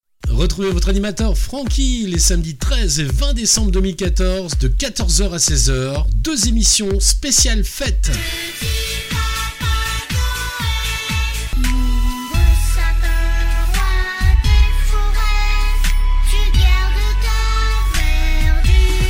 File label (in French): jingle noel pub radio